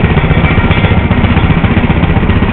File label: SFX Tank